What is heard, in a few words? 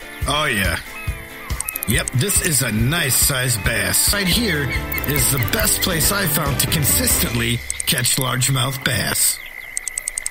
bass, nice bass